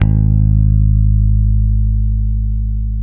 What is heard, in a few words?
ROCK G#2, bass; Spectrasonics; Bass Legends